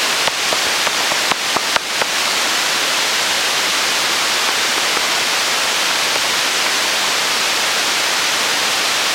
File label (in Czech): netopyr severni